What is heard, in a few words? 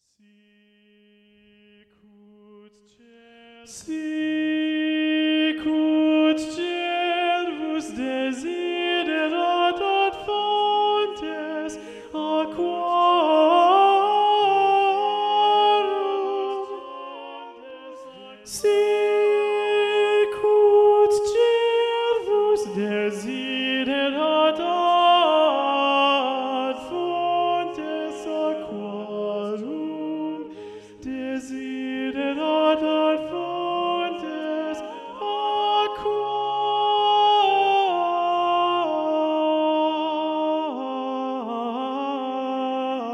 alto predominant; sicut cervus; palestrina